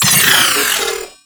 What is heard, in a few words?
Riot Moa Vocal Reboot Deactivate; Warframe